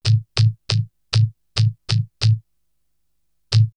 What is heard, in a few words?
Vocoder Loop; X-Static Goldmine; VocoderLoops